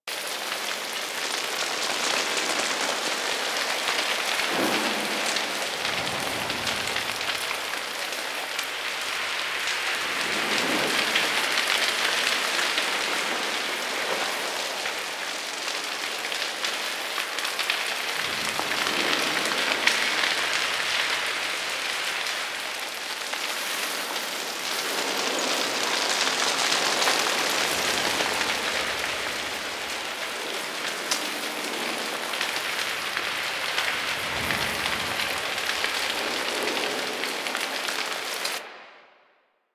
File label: Forest Distant Fire Loop; Ambience; Props; Warframe; Grineer Forest